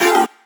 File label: G STAB, funky